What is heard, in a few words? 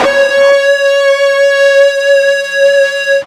FUZZ C#4, ULTRA FUZZ, Guitar; East West; Steve Stevens Guitar Samples Collection